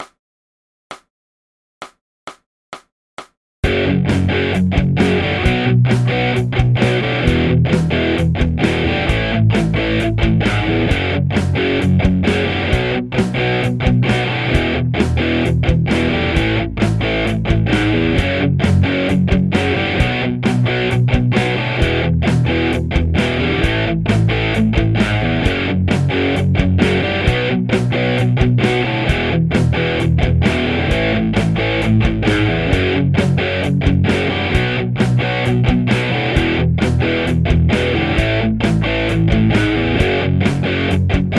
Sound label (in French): Créer un thème playback